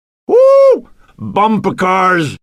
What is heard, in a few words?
woo bumper cars